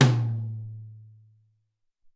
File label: Big Tom M, Alesis SR-18